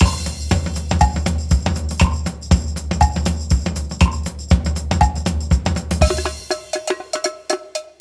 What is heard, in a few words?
free spin intro splash loop standard; santa vs rudolf